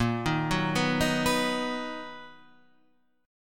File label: B Major A sharp; B/A#